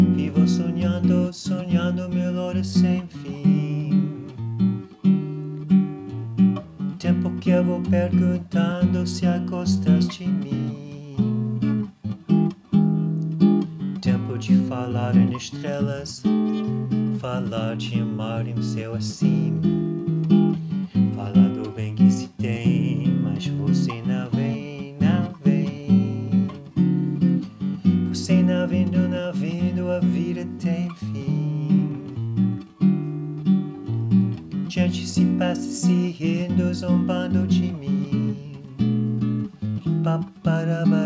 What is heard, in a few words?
Solo: Guitar + Vocals